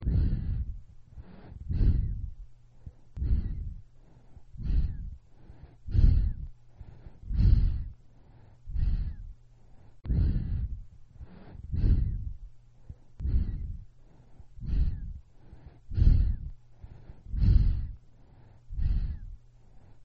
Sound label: Nursery Baby Sleeping